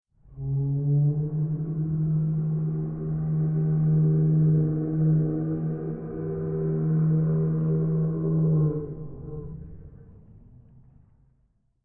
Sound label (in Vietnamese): am thanh cua mot con ca voi o phia xa